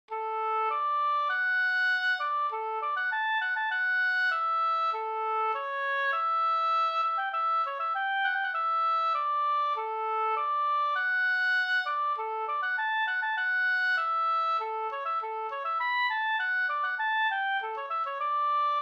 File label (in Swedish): ganglat; fr Falsterbo bruk Sm